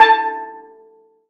Steel Drum A3; Planet Earth; Digital Sound Factory Planet Earth

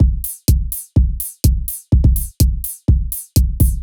125bpm, House Drumloops

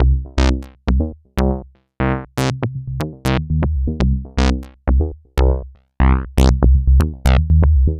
Bassline, Prototechno

